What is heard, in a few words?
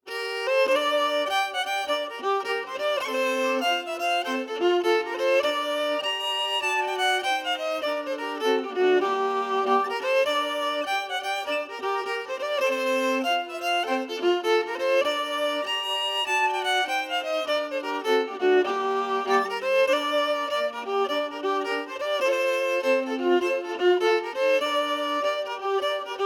Melody emphasized; Miss Sophia Campbell